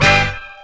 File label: HORNHIT; E-Lab; X Static Goldmine 2; akai